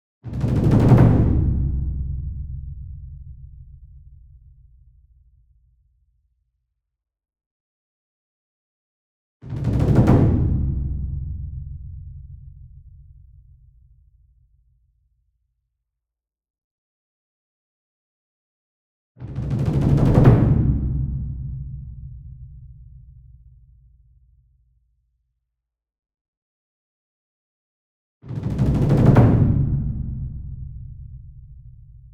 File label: SINGLE DRUM Gong Roll Hard Beater Medium, Cinematic Strikes; boom library; Cinematic Strikes Construction Kit